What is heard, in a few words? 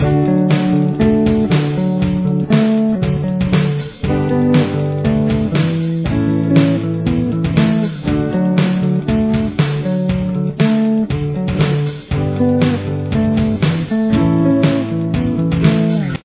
chorus, guitar